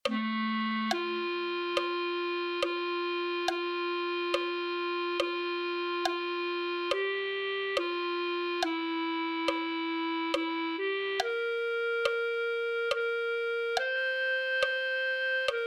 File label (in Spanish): clarinet1